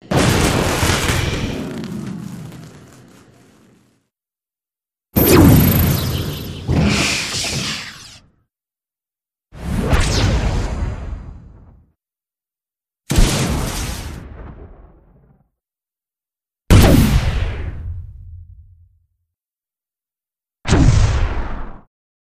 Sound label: Explosive Bursts X6